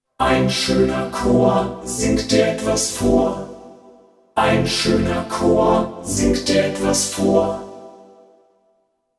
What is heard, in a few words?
VP550 Male + Female